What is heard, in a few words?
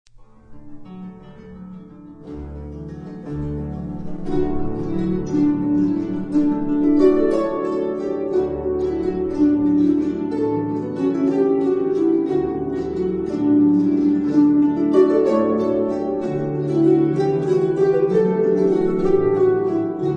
rolling waves